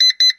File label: double beep beep